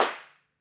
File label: Sweet Claps